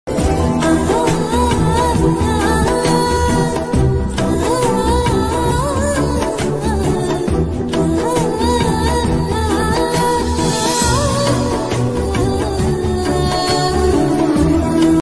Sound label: tamil song ringtone tamil love ringtone bgm love ringtone bgm